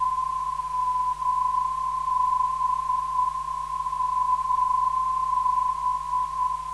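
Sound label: 404.03kHz, non-directional beacon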